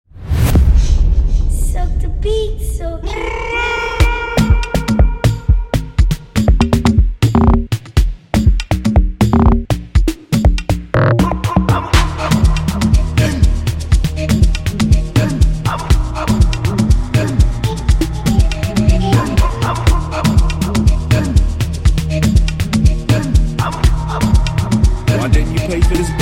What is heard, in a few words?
100 Beat